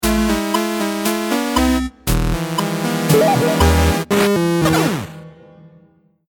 deathcam

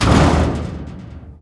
office garage door arrive; doors

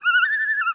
loon; Counter Strike Model Pack; CS:GO

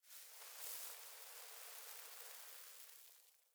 sand gust; ambient; dust2

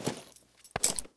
knife falchion idle; weapons; Counter Strike Model Pack; CS2

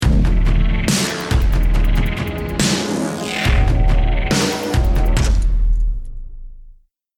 lost round, music

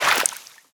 water entry

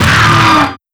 flinch, strider, combat, damaged; creatures

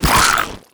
attack latch, headcrab; creatures; headcrab classic

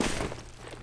footsteps, grass; player

